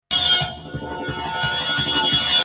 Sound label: spinner cut short